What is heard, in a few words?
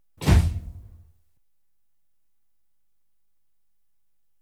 Electric Trains Carriage door; BBC Sound Effects Library